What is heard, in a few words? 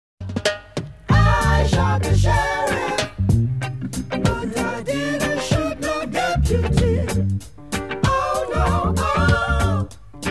win, music